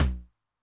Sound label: LT MOD Noise Low, 909mod LOW TOM; classic machines